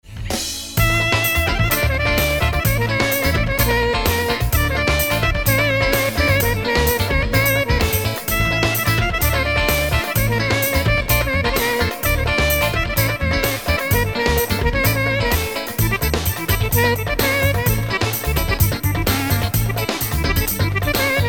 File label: clarino; triton extreme